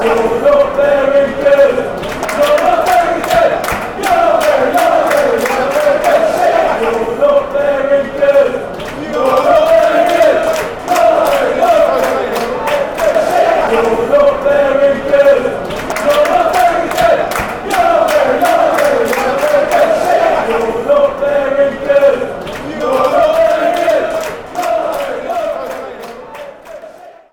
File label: you're not very good, fanchants; watford